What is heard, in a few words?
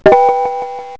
start; JEWELS